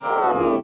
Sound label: robot sigh